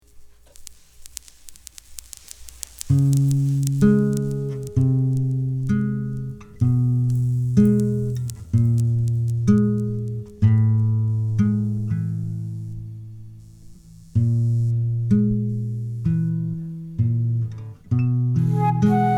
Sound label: empty broken birds